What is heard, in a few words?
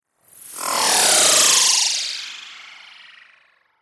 Whoosh, Flutter, Digital; 5.1